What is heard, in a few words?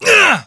melee attack